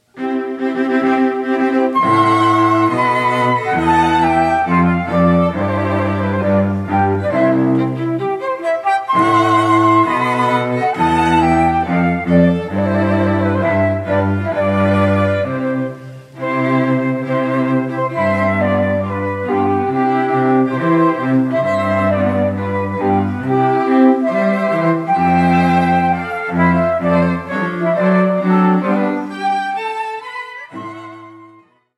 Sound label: Flute And String Trio performing Mendelssohns Wedding March